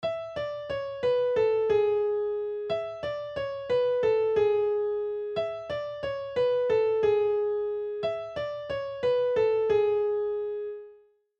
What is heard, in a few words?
KEY of A